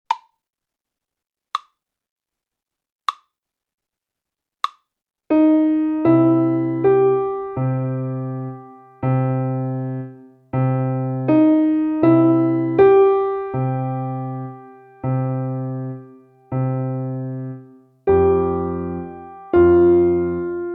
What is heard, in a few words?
Coffee Blues slow